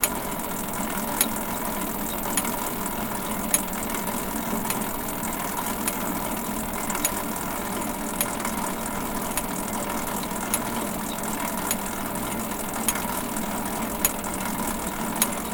industrial farm irrigation system sprayer hose retract machine whirr